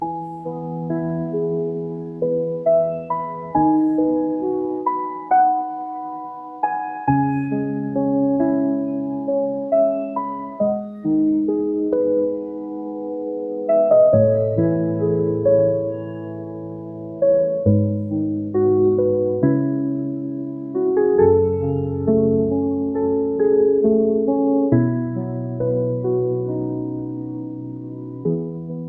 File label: piano calm